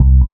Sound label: Elc Bass; Equipped Music